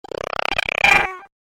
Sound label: Cyborg The Cat, robot sound effect; Robot Sound Effects; Andrew Fleming